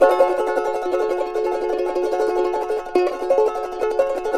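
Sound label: charango G minor tremolo; Spectrasonics; Hans Zimmer Guitars VOL-2; CHARANGO CHD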